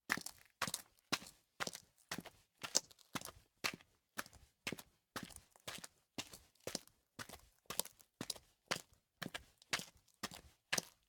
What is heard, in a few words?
Footsteps Pumps; LOOPERS